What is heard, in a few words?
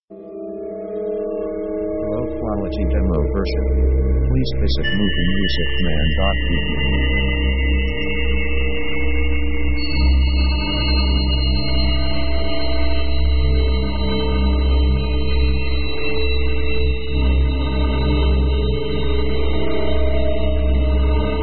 minimal atmo dark; synth themes